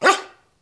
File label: Dog Idle; In the Line of Duty Firefighter